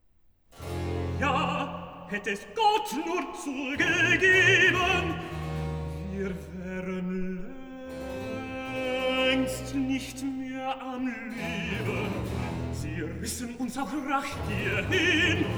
Cantata, Recitativo (Tenor); BWV 14 'Wär Gott nicht mit uns diese Zeit'; Bach; Romsey; 4th Sun After Epiphany

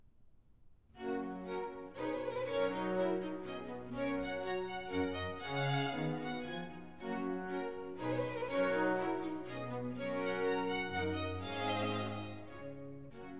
Country Dance; Dioclesian, Act V

